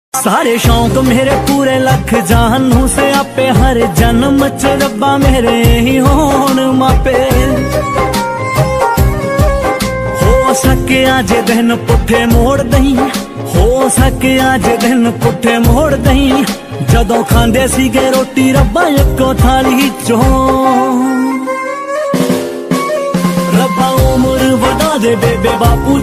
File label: punjabi ringtone song